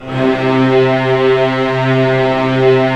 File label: STR, STRING; Roland - LA Composer vol. 1